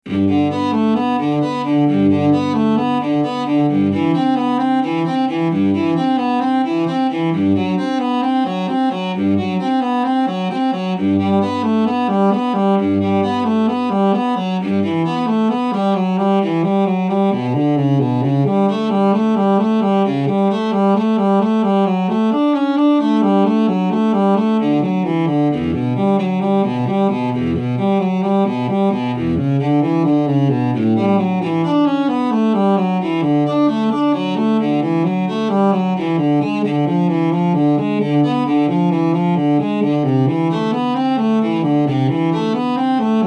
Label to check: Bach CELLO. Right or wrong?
Right